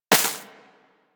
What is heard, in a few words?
Bullet Impact Debris Snow